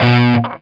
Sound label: C 4, Soldano rock guitar; Spectrasonics; Hans Zimmer Guitars VOL-1